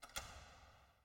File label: lock distant; angband